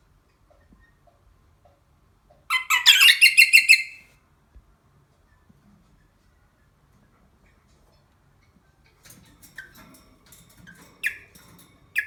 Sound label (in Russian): popugaj rozella golos